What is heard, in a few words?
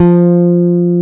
EBASS F3